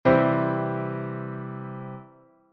C akkoord

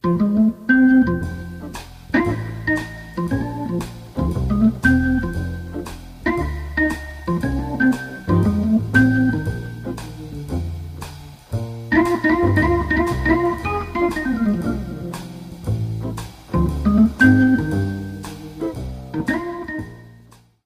Hammondorgel

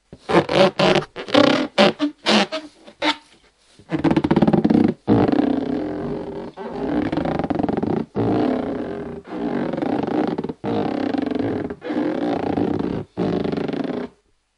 ballon wrijven

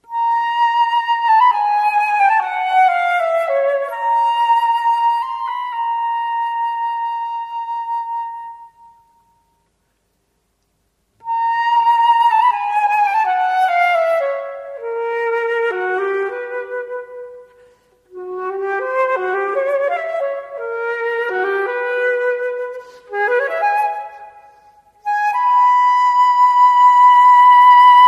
Fluit Syrinx

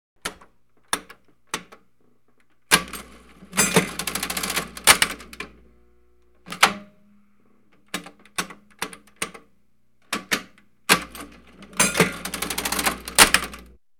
oude kassa

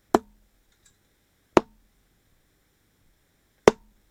darts

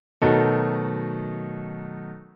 B7 akkoord

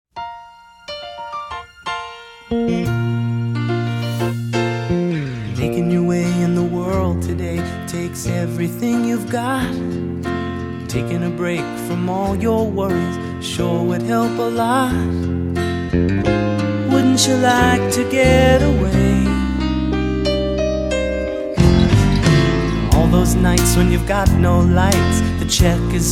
cheers

